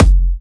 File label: KICK